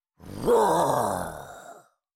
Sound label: Cartoon lion voice roar